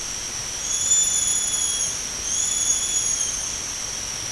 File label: loris vocalisations